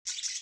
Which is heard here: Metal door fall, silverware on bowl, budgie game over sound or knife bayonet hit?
budgie game over sound